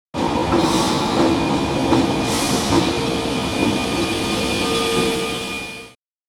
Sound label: train bra